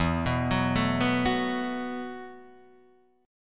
E Suspended 2nd Suspended 4th E, down, Guitar, DADGAD